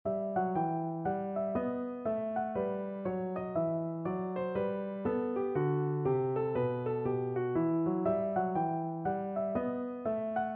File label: bach bouree, piano duet